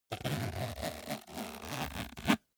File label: zipper small case open slow